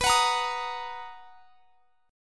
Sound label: B Major 7th B down; strummed